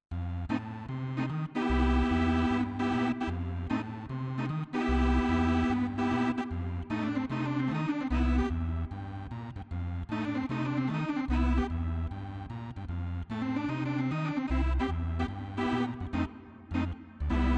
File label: Organ; NumaOrgan2